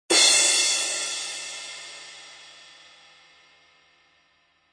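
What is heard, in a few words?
Loop Crash 98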